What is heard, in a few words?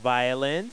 string; My Science Fair